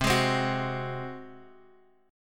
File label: Gb Suspended 2nd Flat 5th